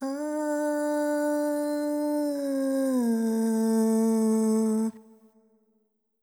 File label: ETHNO FEMALE, Vocals; ethno2